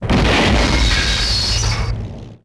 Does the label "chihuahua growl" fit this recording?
No